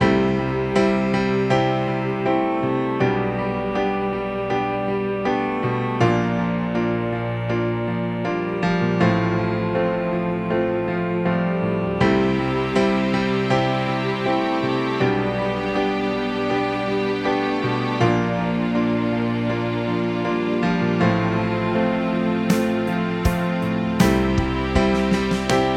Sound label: Short Piano